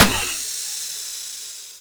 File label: Car Tire Pop; Warframe; Nineteen Ninety Nine